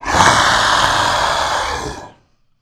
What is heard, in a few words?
pain; agrunt